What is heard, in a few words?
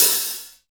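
Dixie hi hat open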